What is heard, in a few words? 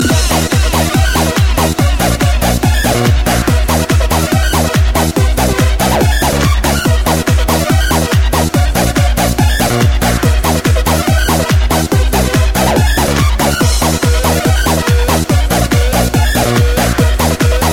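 club ringtone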